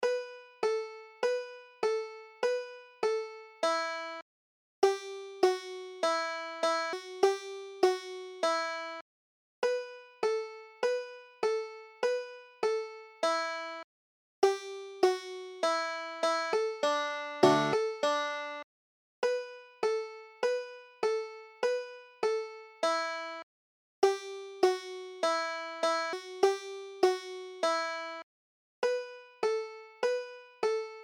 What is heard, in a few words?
waiting for nancy, banjo